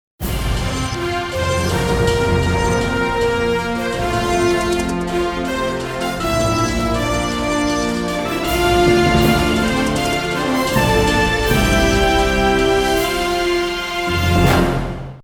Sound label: ringtone; nova